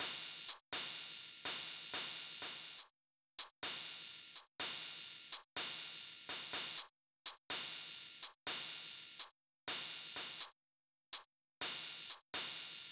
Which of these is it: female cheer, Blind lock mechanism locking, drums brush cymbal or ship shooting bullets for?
drums brush cymbal